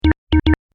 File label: Beep And Bleep Sequence; electronic; beeps, bleeps, blips; Andrew Fleming